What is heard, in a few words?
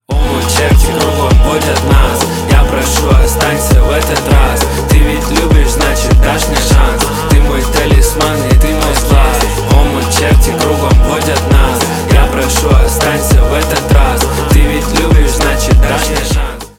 polka